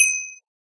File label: successful hit; Minecraft